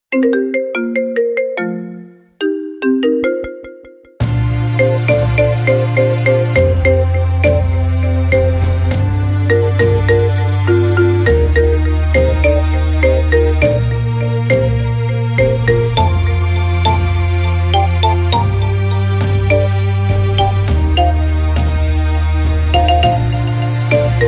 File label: marimba remix ringtone